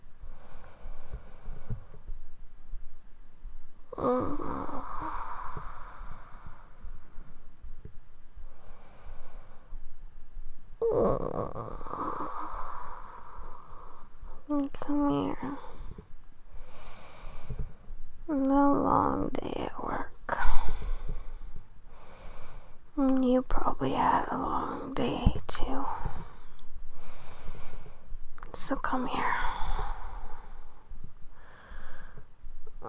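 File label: cuddles yawning comfy noises, female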